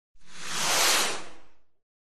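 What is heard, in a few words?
rocket launch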